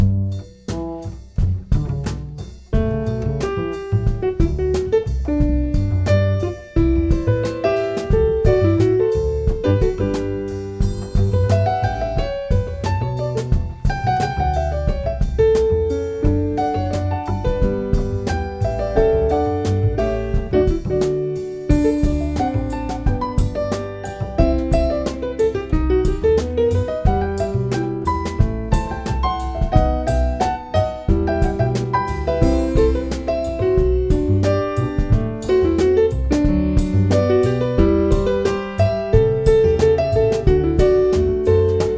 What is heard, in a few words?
Variations, for keyboard